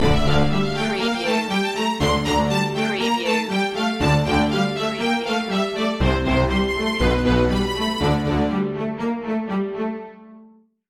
ending

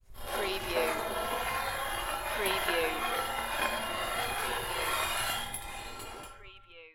Metal Grind Scrape Large Rock Along Metal Bar

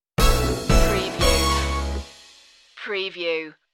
fill the floor stinger

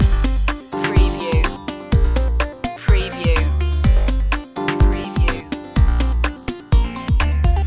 loop, chill

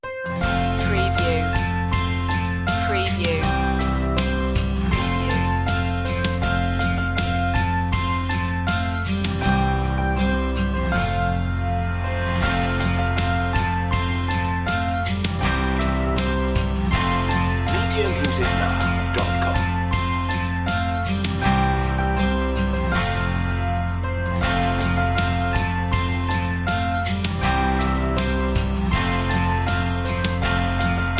the wheels on the bus instr version no sound fx

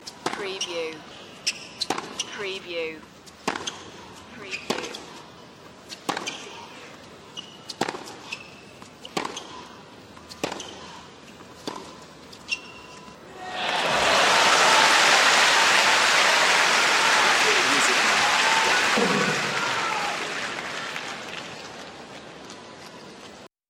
Tennis Point Cheer; Sports & Games / Tennis & Table Tennis

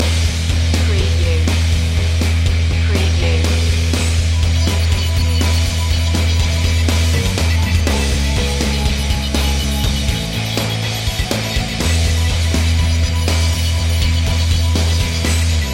flying solo loop

